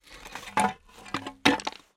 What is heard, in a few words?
metal pole rusty drag on loose hard surface